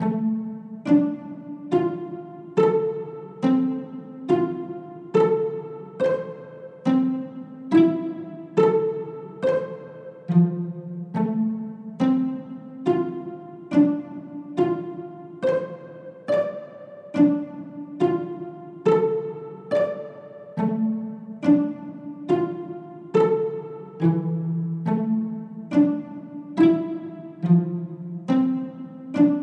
Violon; Type:Rider; PS Vita; Didot Violon Cour 2

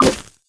Caisse Eclat; Puddle Plus

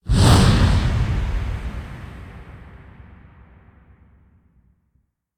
Carburant Start

